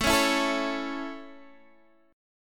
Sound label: C sharp Minor Sharp 5th, guitar chord